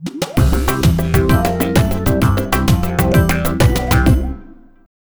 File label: Stab; Buzz! Junior Robojam; PS2